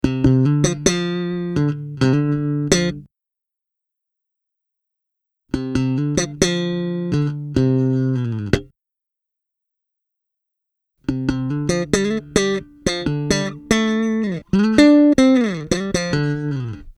Intro Lead

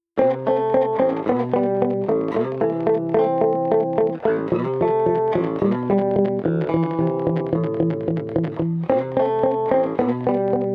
SLAP CHORDS HAAS; mike lull

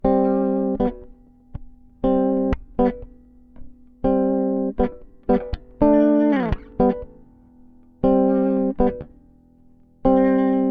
jam chords

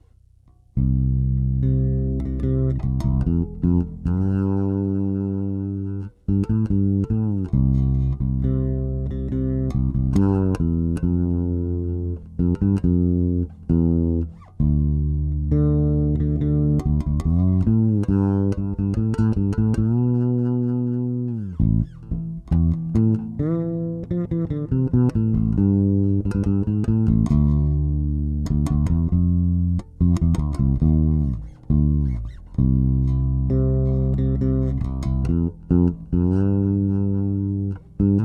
Q Tuner Both, pickups

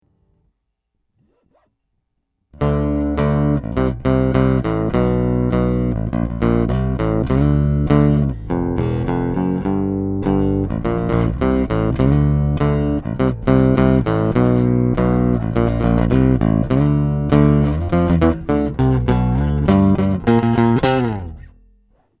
8 flat pick, 8 string

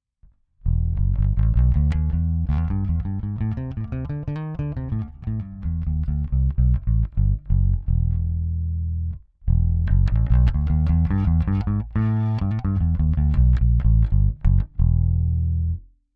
bmax active gain comp, bass; bbe bmax preamp

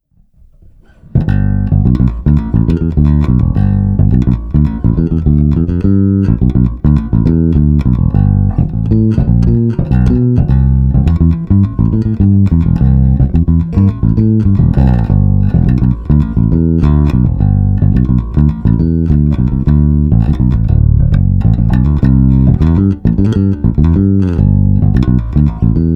finger direct

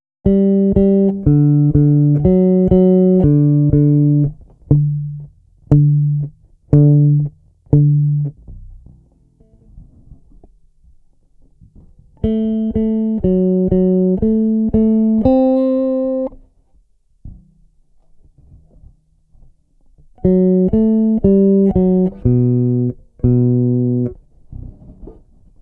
SKIP CLIP VIOLA

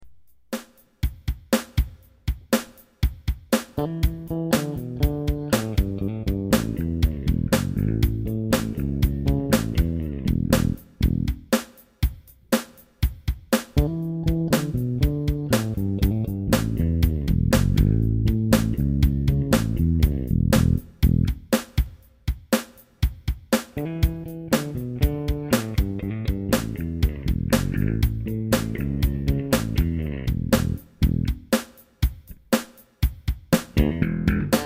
Thumb NT5 Z Modes, bass; warwick thumb nt 5

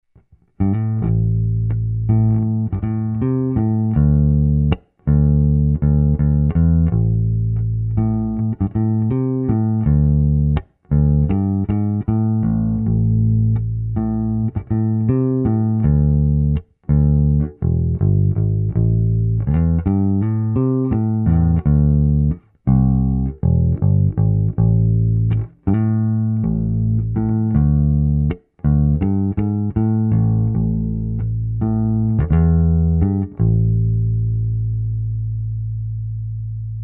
UA flat 900 power amp; streamliner 900 tone voicing tests; STM900

